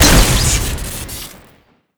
homebrew flare shoot crit, weapons; tf2classic

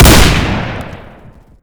r870 shoot; tf2classic

